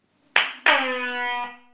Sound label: IR Maya Pyramid